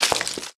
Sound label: agricultural tower harvesting, factorio sound entity